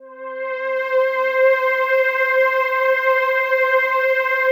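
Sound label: PAD, atmospheric pads; Best Service; XX Large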